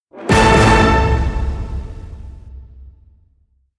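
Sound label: hit orchestra glam double a; brand x music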